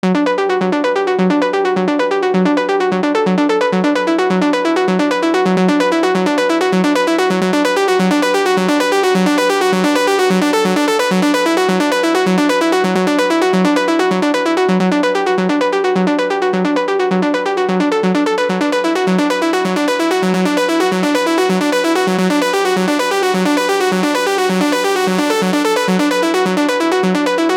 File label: hybrid trance saw; porphyra hybrid; ocean swift synthesis